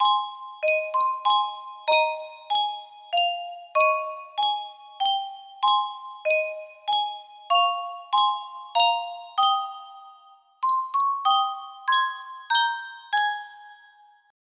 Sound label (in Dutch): Yunsheng muziekdoosje, music box melody; efteling